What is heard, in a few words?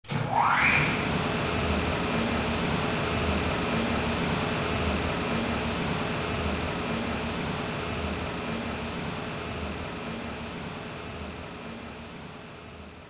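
Elevator move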